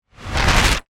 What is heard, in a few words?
Flash Back Hit; Sound Design; BlastWave